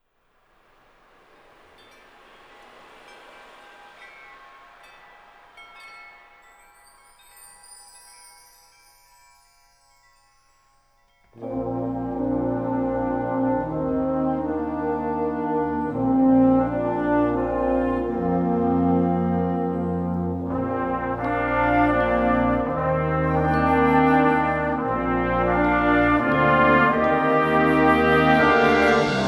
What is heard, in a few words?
Gentle Winds, wind band